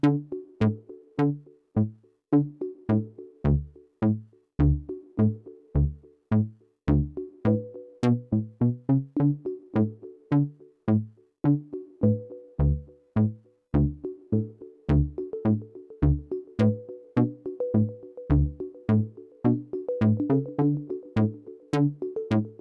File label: Battle Layer Synth; Plants vs. Zombies Battle for Neighborville